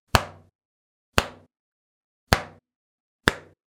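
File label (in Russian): звук резинки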